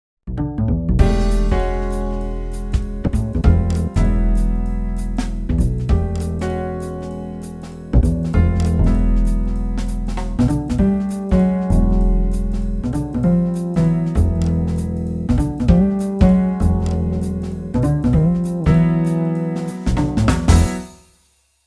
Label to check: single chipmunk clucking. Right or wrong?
Wrong